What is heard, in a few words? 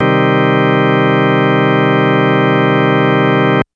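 Organ B3 minor; TrashLibrary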